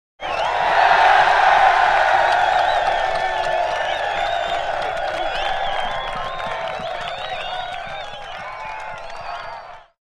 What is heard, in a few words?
Infantry Cheers 1; 1000 Men Cheer